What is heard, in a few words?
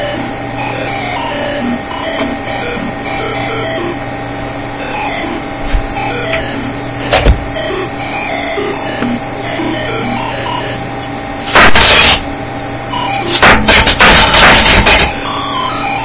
Ambient